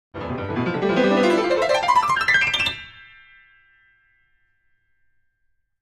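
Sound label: Grand Piano Funny Short; keyboards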